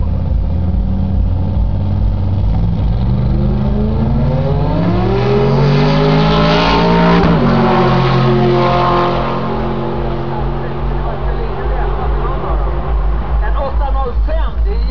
twinturbo m power vs twinturbo v8 american muscle race